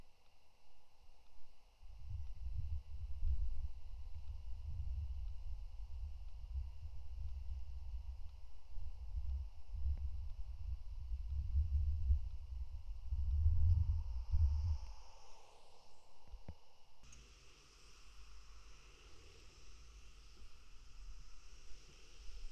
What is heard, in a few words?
traffic noise; Traffic noise at an intersection recorded by a contact mic